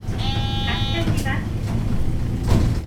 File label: door